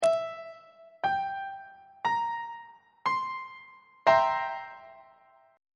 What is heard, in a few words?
b sharp dominant 7th 1st inversion on treble clef